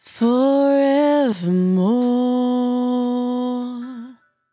100bpm Bm, Velvet Vocal; Zero-G

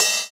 Cymbal, JUNGLE BREAKS DRUM SOUNDS; ORIGINAL JUNGLE BREAKS; JBK CYMBALS

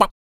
KUK, MALE PERC; Spectrasonics Vocal Planet; Jazz FX

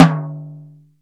ring tom, Jazz; SN-R8-05 Jazz

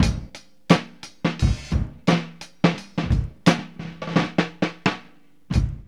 BREAK NOW 88, Funky Elements loops; Zero-G

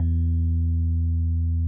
RHODES E1; Sonic Reality - Vintage Timetraveler

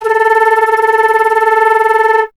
flute A; Miroslav Vitous - Solo Instruments VOL-2; Solo lnst. II

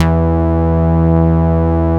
MOOG E3F; 90S MOOG

